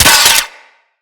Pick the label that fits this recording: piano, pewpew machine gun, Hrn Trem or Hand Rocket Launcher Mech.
Hand Rocket Launcher Mech